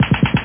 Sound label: machinegun; F1 Licenseware - Volume 1